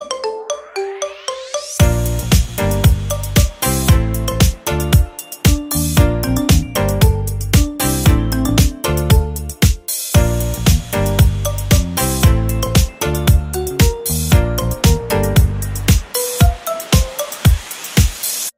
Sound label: Marimba Remix